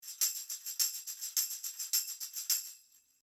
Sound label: Shure SM7B Tambourin 1 Meter Behind 180